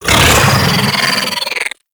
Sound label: Pet Vocal Angry; Warframe; Alad V Pet